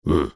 attack; Armordillo

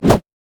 crit attack; malphite

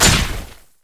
basicattack human; LoL; shyvana

